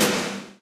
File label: vote yes